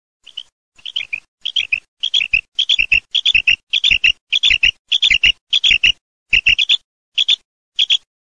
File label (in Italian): QUAGLIE FEMMINA